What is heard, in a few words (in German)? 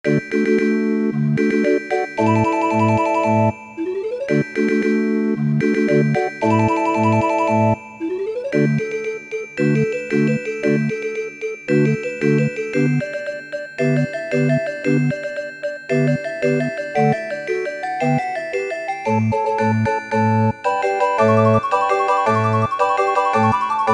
orgelfanfare